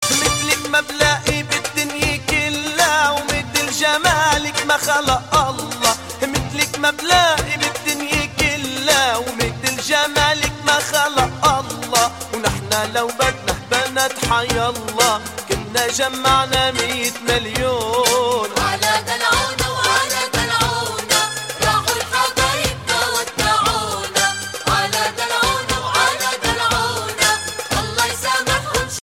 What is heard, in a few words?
dabke arabic ringtone